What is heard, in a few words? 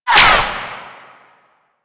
Ranged Weapon Whizzby; Warframe; Necramech